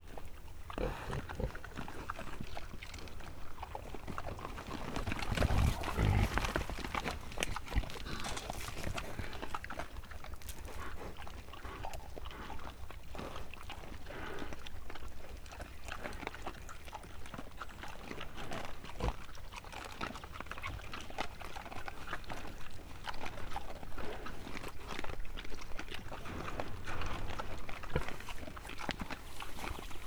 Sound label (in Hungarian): röffentés mozdulás, vaddisznó; állathangok; miskolci zoo 2009